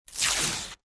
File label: paper rip